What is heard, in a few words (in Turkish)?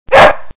köpek, hayvanlar